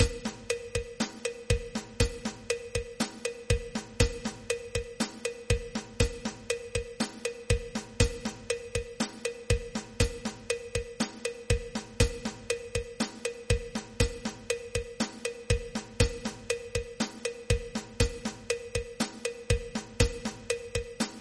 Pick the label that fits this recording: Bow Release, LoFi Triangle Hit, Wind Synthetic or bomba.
bomba